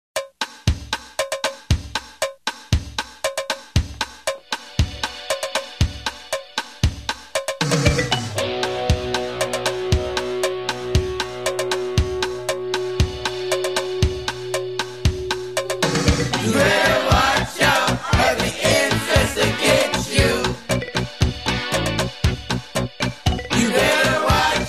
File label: kids singing insects